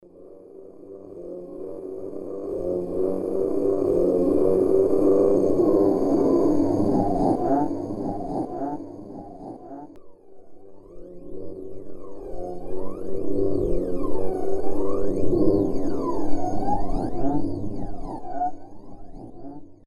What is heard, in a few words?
horror ambiance; mike koenig